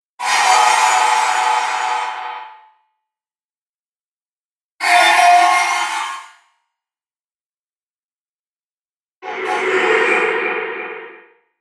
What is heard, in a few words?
Space Zooms, whoosh; Space Zooms - Pack 4; Whoosh Sound Effects; Andrew Fleming